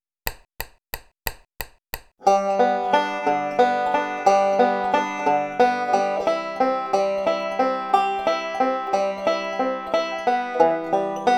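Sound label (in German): begleitung mit roll im sechsachteltakt, banjo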